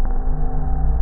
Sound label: repeat synth; Solidstate